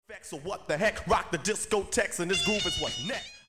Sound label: Vocals ('rock the discotheques')